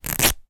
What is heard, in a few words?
foley cloth denim rip